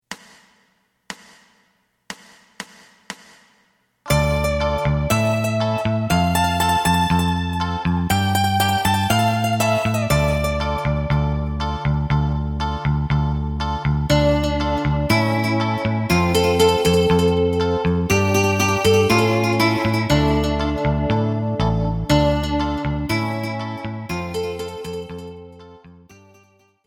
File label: drum